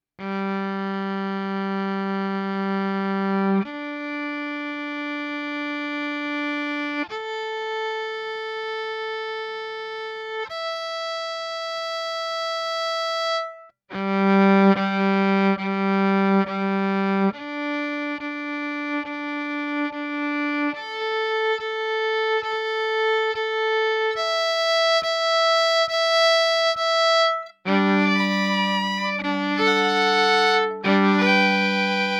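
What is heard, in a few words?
Vln Yamaha pickup lo boost, violin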